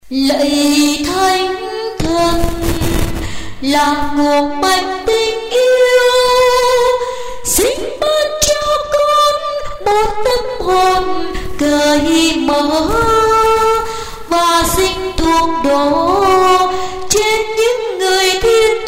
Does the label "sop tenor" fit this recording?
Yes